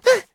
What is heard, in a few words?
weird effort; Nut Boarding